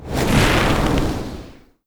Enemy Majin Active Charge Loop

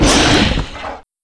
Animatronic scream short

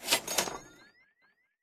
Cash Register; Going Under

